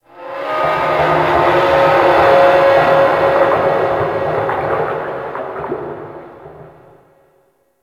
Ocean Pressure Creak; Warframe; Grineer Ocean

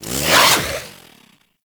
Kusarigama Plasma Whoosh; Grn Kusarigama

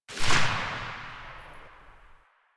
Pistol Tail; Warframe; Tenno; Lasrian

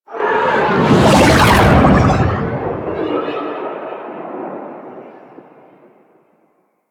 Insect Liset Fly By Slow; Liset Flight

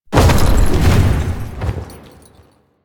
Horse Glide Start; Vehicles; Duviri

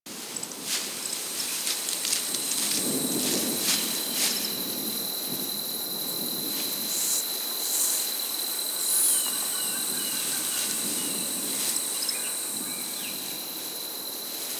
Exterior Foreground Field Loop; Ambience; Duviri; Warframe

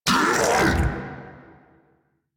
hand bomber pain; Warframe

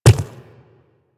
Quadruped Medium Walk Default, footsteps

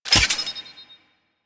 Rifle Reload B; Sundial

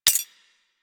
Point Drop; UI; Pickups; Greed; Warframe

